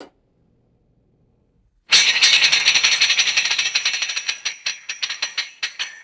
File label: reverberant metal impact and wire rattling; objects